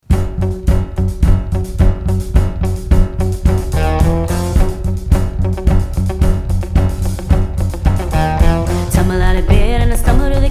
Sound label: Live Band; Candy Beats